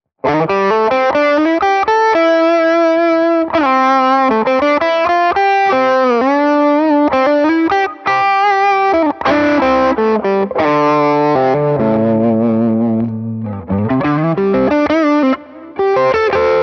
Amps Suite Fusion Lead; Morgan; SW50R

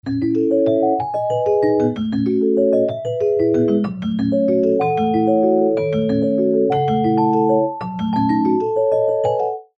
Arabesque Celesta

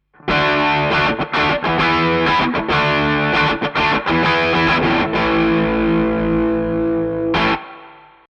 RME UFX Electric Guitar Crunch; Melodium42bn

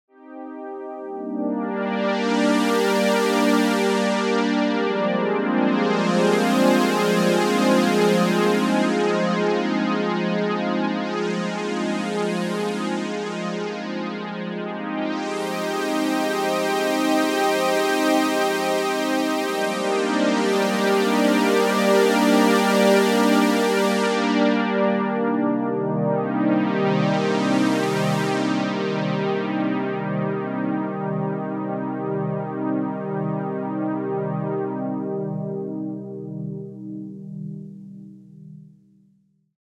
Roland JX 08

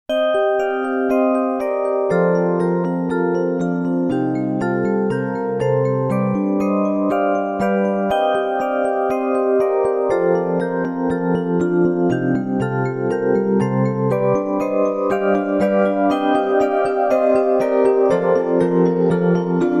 Sequential Prophet X; Bedtime

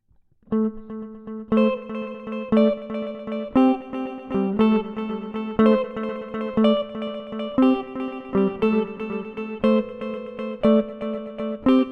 Boss DD 200 Pattern

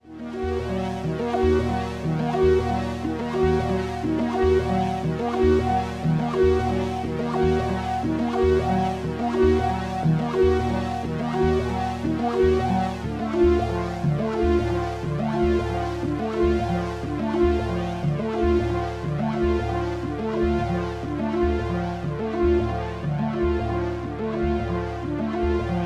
Rossum Electro Music Morpheus 5kHz

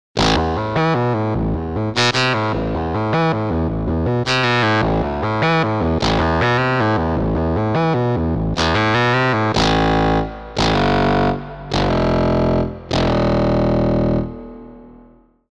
Korg Prophecy VST Reed Viola

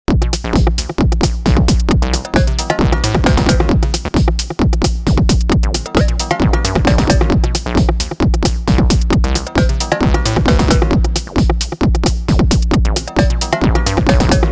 JoMoX Alpha Base

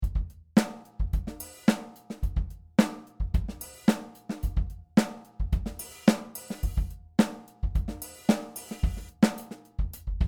groove

